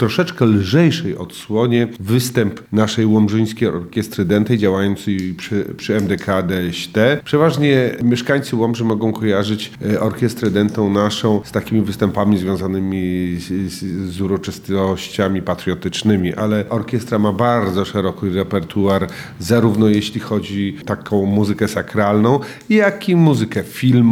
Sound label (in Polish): w rytmie pop; lomza koncert lomzynskiej orkiestry detej